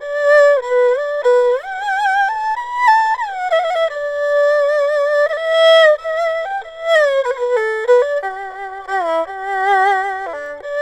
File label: ERHU 110 G; INIS - Opium; ERHU 90-G